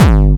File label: BD Distortion, Bassdrums; Vengeance Samples